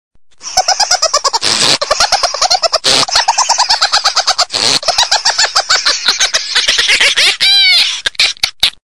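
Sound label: laugh joke; smeh prikol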